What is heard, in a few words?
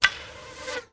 medieval scythian recurve arrow approach; still north media